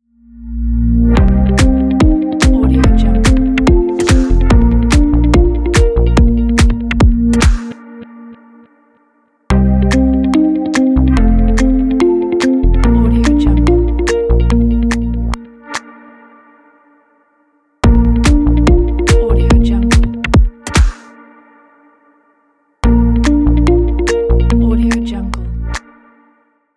modern corporate upbeat logo

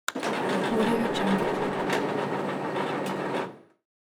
copy machine

